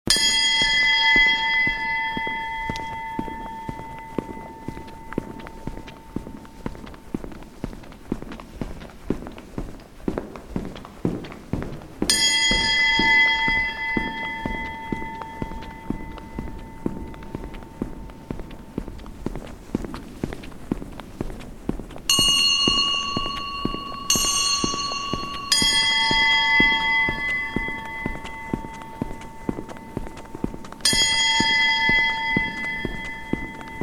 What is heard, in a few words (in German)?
schritte glockeeee